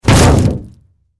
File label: Impact Car Human Crash Land Hood Roof Metal Bang Hit; Impact Car Human Splat Crash Land Hood Roof Metal Bang Hit; Blastwave